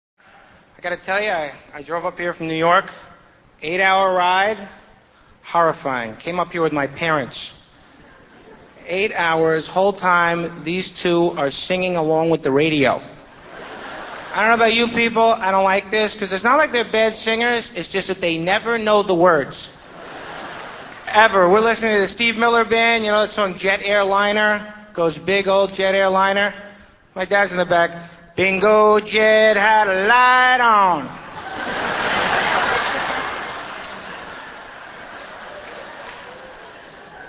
singing in the car; Comedian

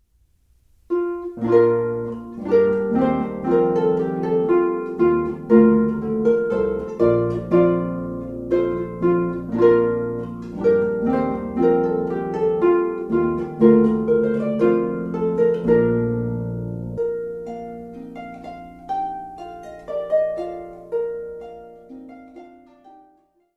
Pavane style Renaissance, harp

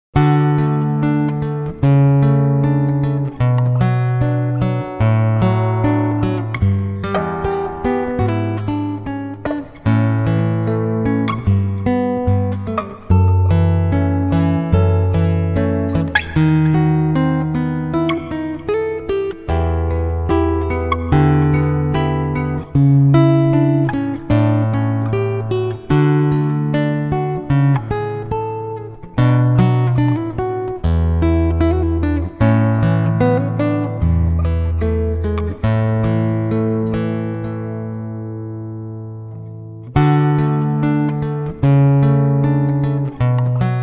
Air On The G String Classical Guitar

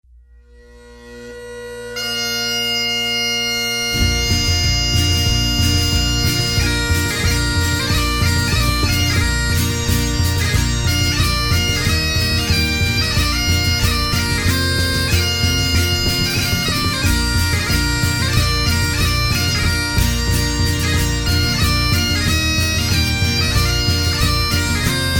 bagpipes, with guitarist

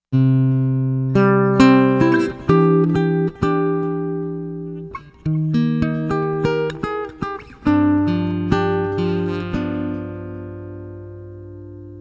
Classical Guitar

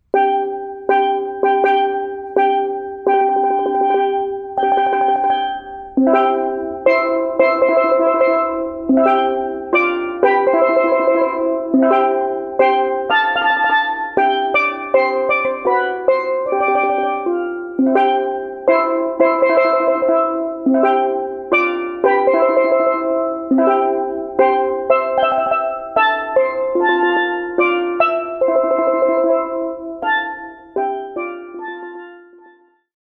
solo steel drum; Bridal March

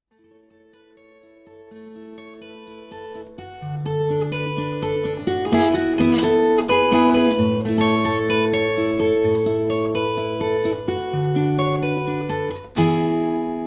solo guitar

